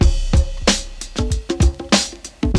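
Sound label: PERCUSSION LOOP, BREAKBEATS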